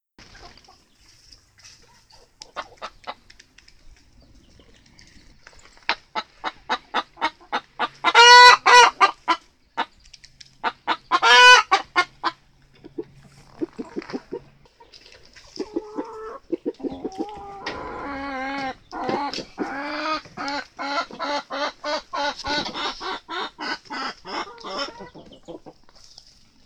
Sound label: chicken